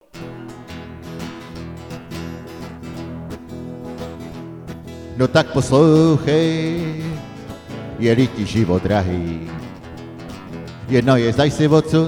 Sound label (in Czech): blues